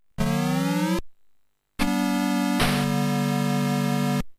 SEGA Master System Startup; Video Game Consoles Startup

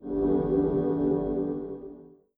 XBOX Four Startup; Video Game Consoles Startup

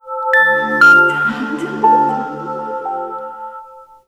Samsung Old Samsung Mobile Shutdown

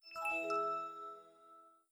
OS2 Warp 7.0 Shutdown; Windows Never Released Soundpack 1

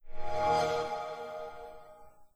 OS Shutdown; Xbox OS Shutdown; Windows Never Released Soundpack 1

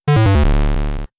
Windows Shutdown; Windows Command 3.0 Shutdown; Windows Never Released Soundpack 2

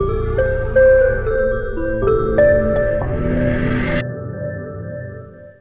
Windows NT 7.0 Pre-Alpha Startup; Windows Never Released Soundpack 1

